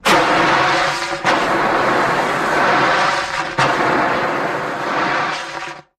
Extinguisher Blasts; fire extinguisher

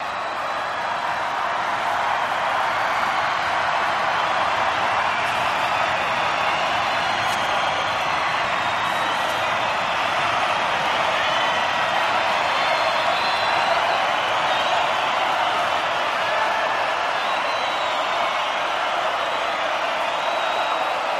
Crowd Roars, concert

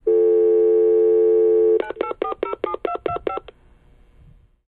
Telephone Futzed Push Button Telephone Dials; Dialing; Telephone, Futzed Push Button Telephone Dials Generic Number ( Redial )

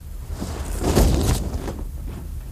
Parachute Opening

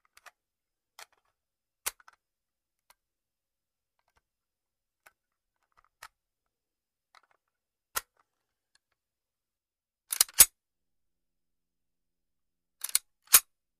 M1 Carbine Rifle Move, foley gun movement

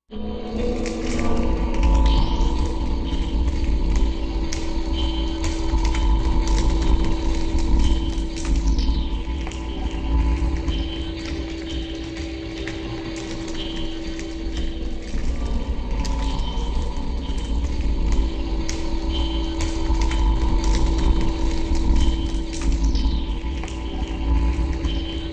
Moving Underground Low, hollow

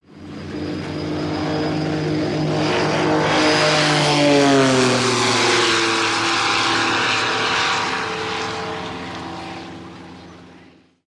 Plane P51 Pass By Lef, ww2-fighters p-51-mustang

